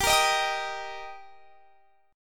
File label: G Minor Sharp 5th G; Gm#5 strummed; x,22,20,20,20,23